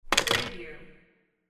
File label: phone hang up